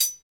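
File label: hi hat; HH-Kits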